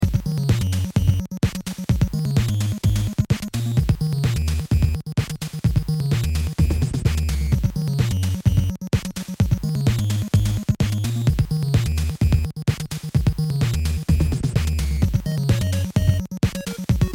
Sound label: beat 47